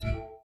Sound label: lobby notification left